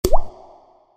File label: Water Drop